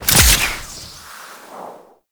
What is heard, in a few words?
Grapple Throw; Warframe; Teshin; Weapons